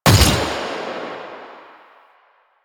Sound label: Fire Shot; Duviri; Incarnon Adapters; Incarnon Sybaris Prime; Weapons